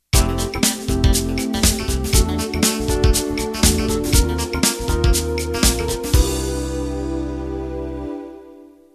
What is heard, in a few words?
Groove End